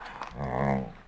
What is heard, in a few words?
wolf heal vox; Civ4 warlords